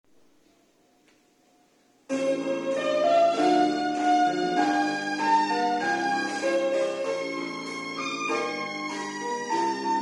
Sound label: hymn